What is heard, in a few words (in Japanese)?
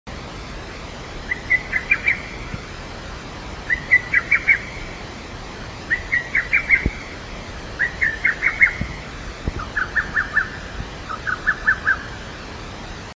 hototogisu; ホトトギス （不如帰）; 社務所裏山で、早暁に回遊飛翔しながら 鳴き声; 蘭梅山; 野鳥